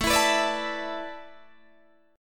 A Suspended 2nd Suspended 4th, Guitar, Open D

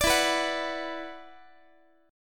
E 7th Suspended 2nd, down, Guitar; E7sus2; strummed

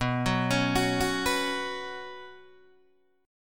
B Suspended 2nd, Guitar, Open D

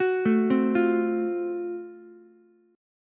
F sharp Minor Major 7th Flat 5th, Ukulele; arpeggiated

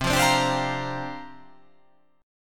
C 9th Suspended 4th C down

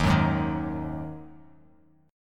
D sharp Minor Double Flat 5th, Bass Standard; strummed

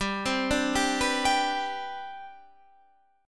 C Major 7th Suspended 2nd, G, chord

